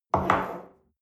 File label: espresso place on table slide soft, restaurant cafe